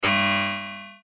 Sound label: Beep Tone; Multimedia Web Interactive; Beeps (Sound Effects:Multimedia; Blastwave; Technology